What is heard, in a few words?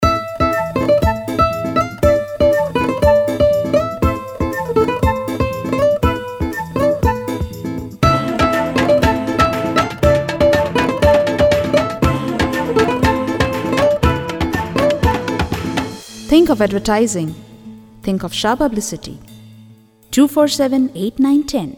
callertune